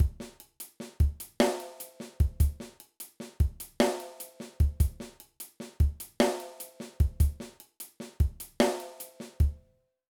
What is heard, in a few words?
Shuffle Groove, drum